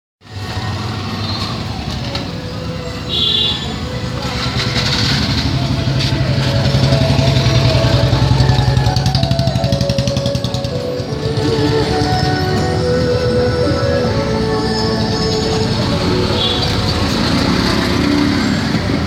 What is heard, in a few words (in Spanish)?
Ruido calle con musica relax; PUSHKAR